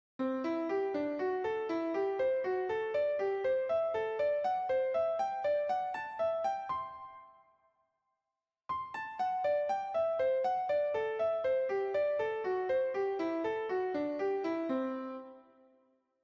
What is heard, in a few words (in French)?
triades, licks